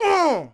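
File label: Archer Attack; Sid Meier's Civilization 4 Complete; Warlords; Units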